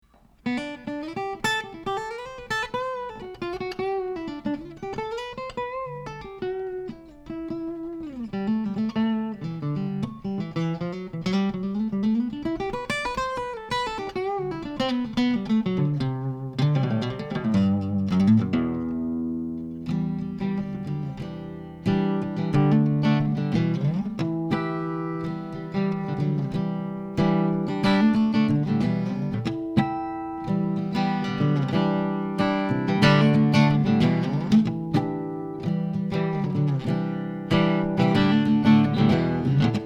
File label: Harmonic; EASTMAN ACOUSTIC SOUND; straight signal with no additional EQ, compresson or effects; Cascade KnuckleHead